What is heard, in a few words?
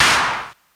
Clap Crunch; One Shots; Drums; Ableton Live 12 Lite; Core Library